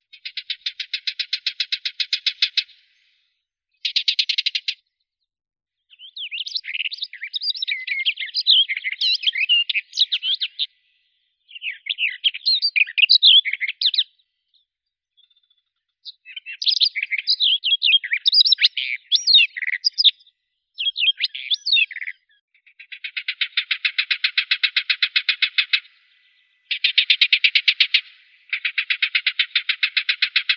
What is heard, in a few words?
slavka yastrebinaya sylvia nisoria